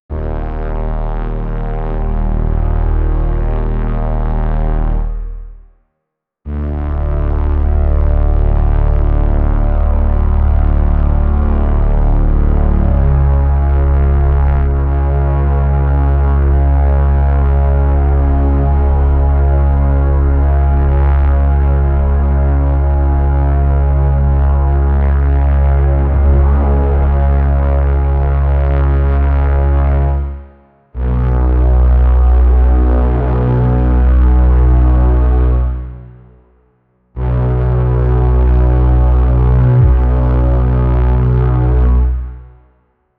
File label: a powerful and energic mo